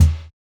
Kick, jungle breaks drum sounds

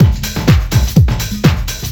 thunderbird, Deep House 125bpm

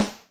Philydry, Electric Snare

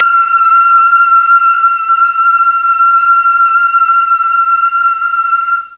F4 BOYS CHOIR, Mellotron; Mike Pinder - Mellotron Archive CD

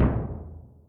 Grn Cassa, Yamaha DTXpress Acoustic Kick

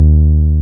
TB303, TB-303 BASS

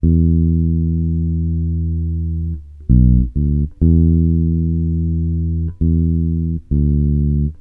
FEAR E, Bass Grooves; USB Soundscan vol.30; AKAI

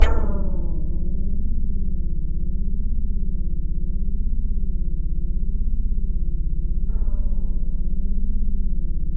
C1, Synth Line; NiceBeats

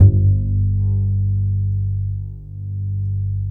G1, DOUBLBASS, Balkanish Traditions; USB Soundscan vol.63; AKAI

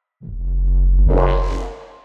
neuro, bass

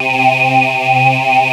VOX PULSER, DANCE; Pro-Rec

